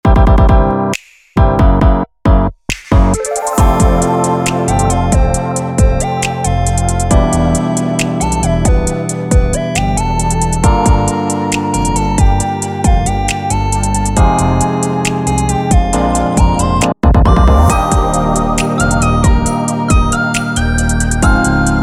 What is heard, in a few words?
Synth Pop